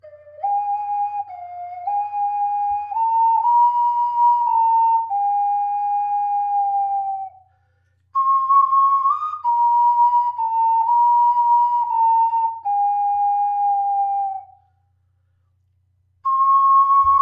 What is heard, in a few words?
windowed, ocarina